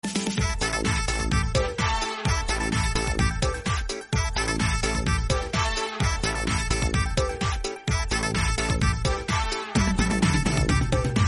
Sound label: theme ringtone; idukki gold